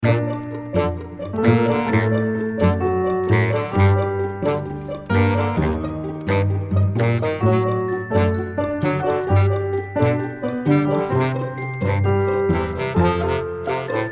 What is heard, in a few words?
Brass Fun